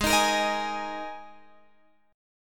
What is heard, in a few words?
G sharp Suspended 2nd, G sharp, down, Guitar, Open F; strummed